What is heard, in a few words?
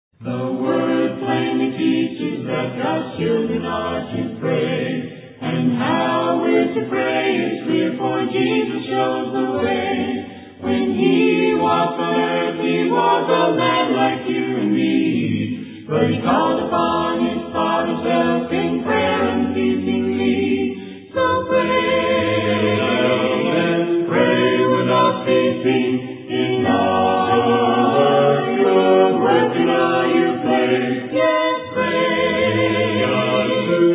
a capella hymn; pray pray pray